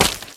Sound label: impact weapon small mud; collide; small-weapon